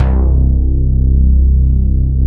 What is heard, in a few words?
NEWAGE BASS